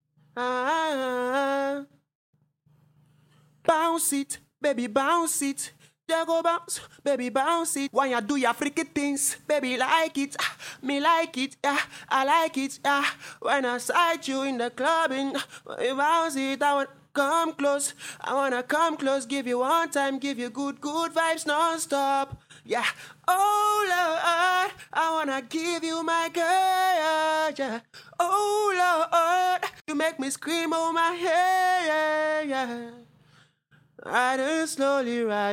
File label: rnb pop 98 EM